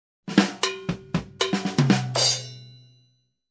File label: Minimalistic jazz drum fill